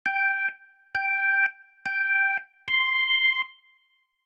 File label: SFX - Racestart